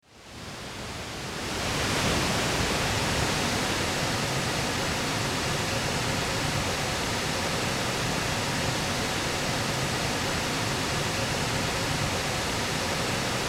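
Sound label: waterfall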